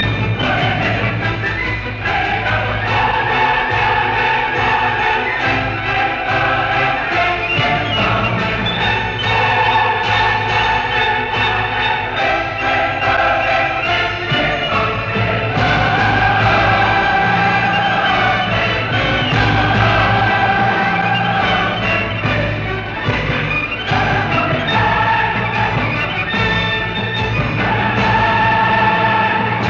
Symphony in D minor Choral Finale Poco allegro stringendo il tempo; Symphony No 9; Op 125